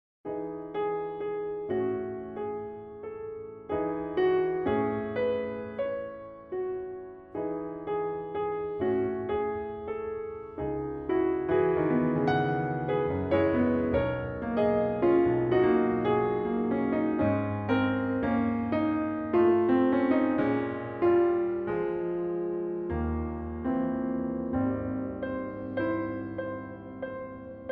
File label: Rain; chris berg